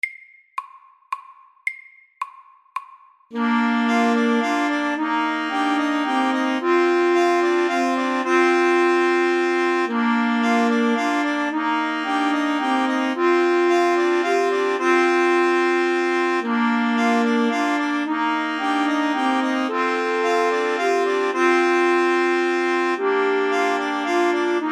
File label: Musette FAST 110